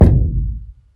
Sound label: appliance hit; hits